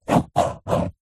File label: office pen ballpoint paper hollow table draw triangle; zapsplat